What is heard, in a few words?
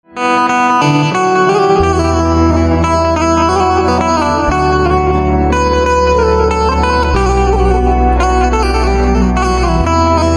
Banjo Instrumental Ringtone